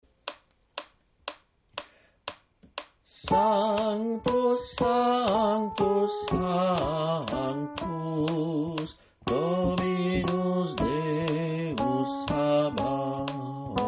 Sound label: alto; Sanctus; Altos